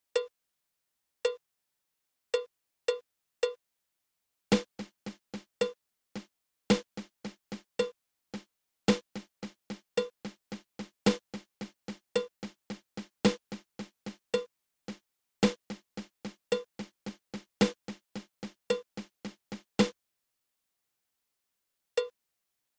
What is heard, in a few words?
Triple Paradiddle